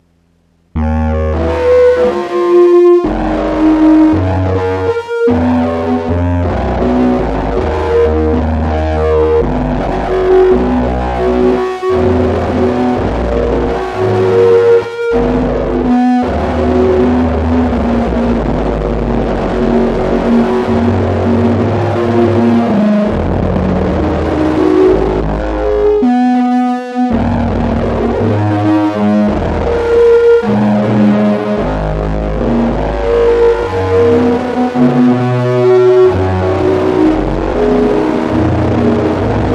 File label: RINGTONE